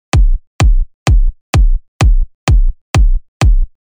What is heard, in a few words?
Normal Kick